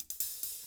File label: BOSSA, Drums; ILIO; Double Platinum Drums 1